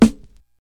verby snare drum sound a key, Royality free snare sample tuned to the A note